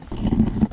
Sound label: Bridge turn